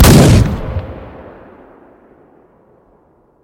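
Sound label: gunshot thunderous accurate enemy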